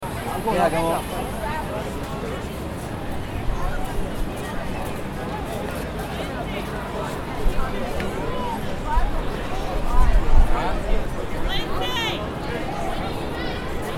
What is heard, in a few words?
New York Times Square